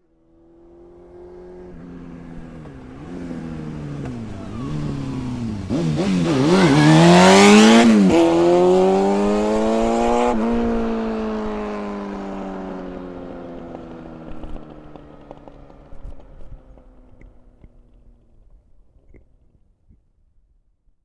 transport motorcycle honda hornet race bike approach slow rev away; fork media